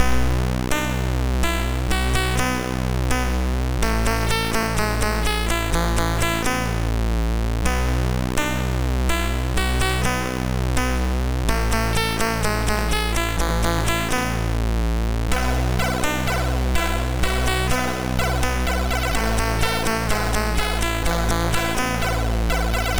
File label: Tune, sid MOS6581R2